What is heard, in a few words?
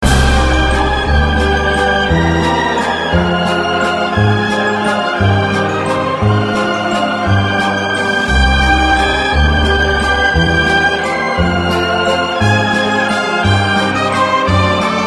Waltz